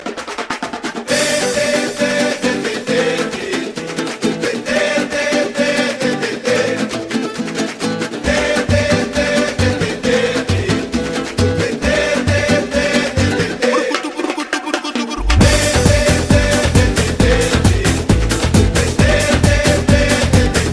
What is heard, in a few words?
samba; bahia